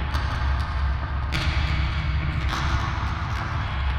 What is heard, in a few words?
sparse soundscape samples; ReLooped